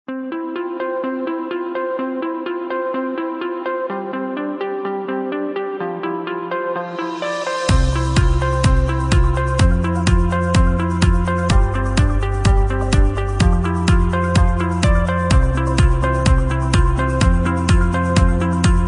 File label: sense of rhythm ringtone